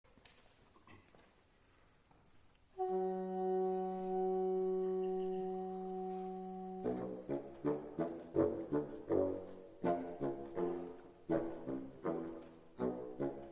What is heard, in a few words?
bassoon